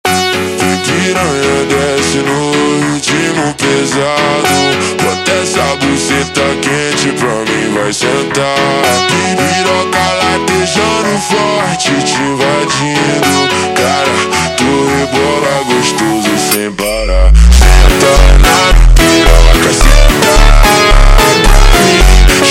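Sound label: super Slowed, phonk music; funk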